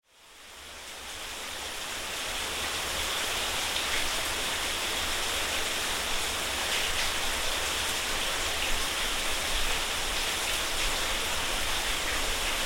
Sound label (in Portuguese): Tanque de água; Candal